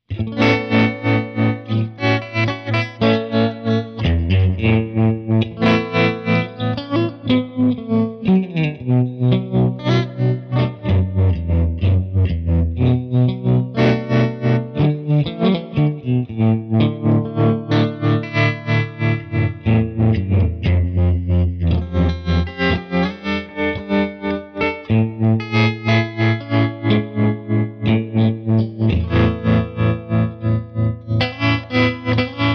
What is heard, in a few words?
Tremster Tremelo On